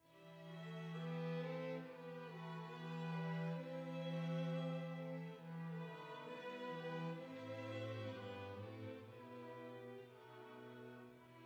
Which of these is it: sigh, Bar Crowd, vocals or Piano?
Piano